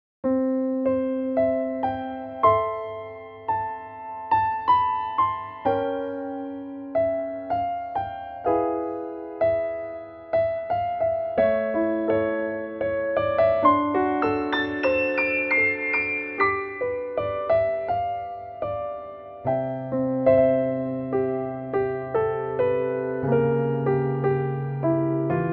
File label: Bechstein Cinematic; Pianoteq